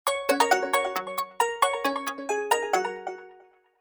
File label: Ringtone smartphones, ringtones; S300; Nobby